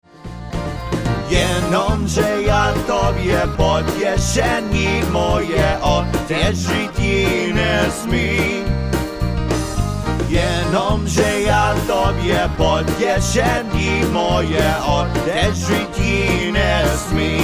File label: Polka